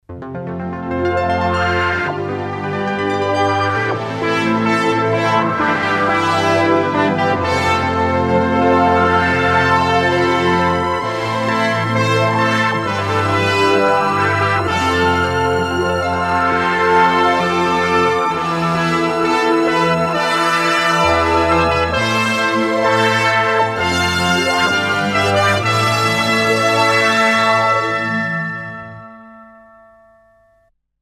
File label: Fanfare for Tomorrow; Nice piece of incidental music used on the Tommy Boyd show